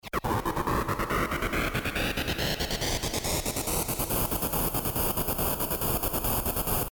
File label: Pink Noise Effix Delayed; Techno